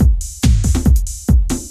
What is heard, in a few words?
techno 140